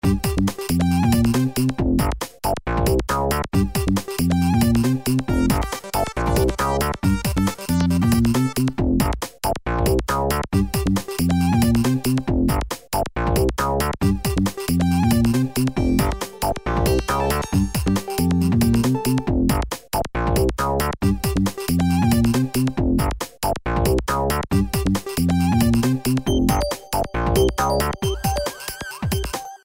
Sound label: Upbeat